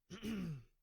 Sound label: ahem